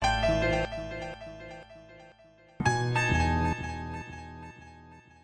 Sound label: 092 piano echoes; SAMPLED MUSIC LOOPS